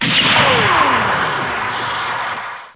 Beam Rifle

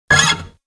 guitar fret noise

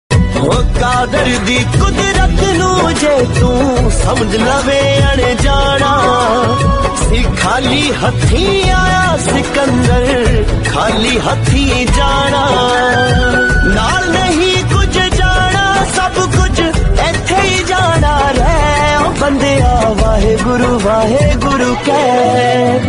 Waheguru Waheguru Keh Ringtone

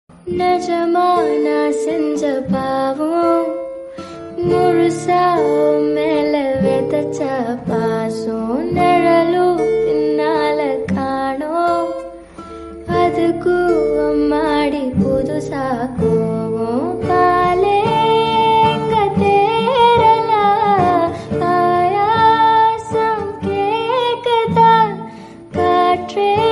Female Voice Tamil Song

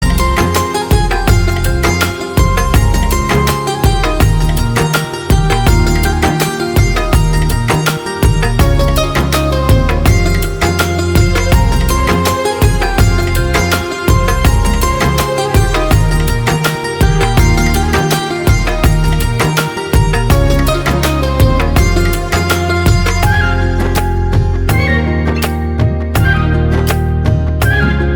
Instrumental Ringtone

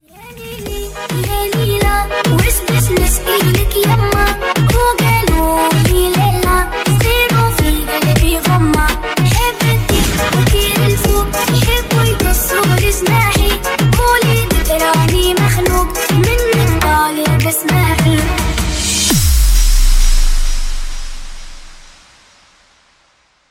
Arabic ringtone; samsung galaxy note 20 ultra

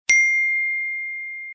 Iphone Notification

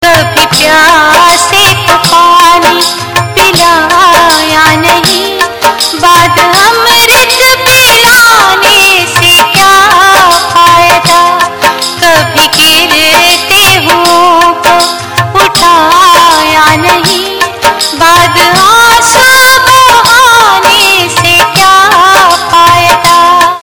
ringtone

